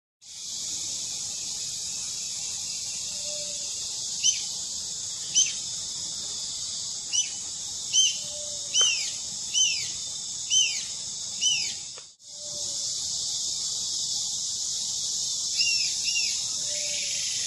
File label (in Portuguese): Canto do ariramba preta